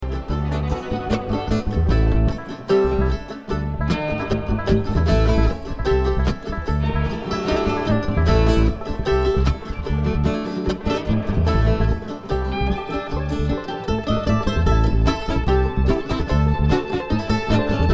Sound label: Traffic Jam